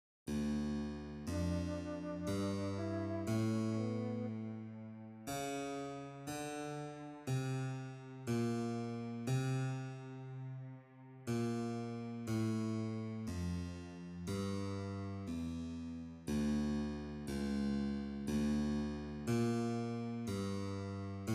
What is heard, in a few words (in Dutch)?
Karaoke d Adagio; solfege eerstejaars zingen ritme